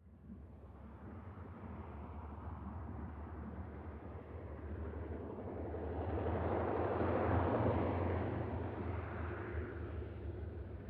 propeller plane ju52 fly by overhead long approach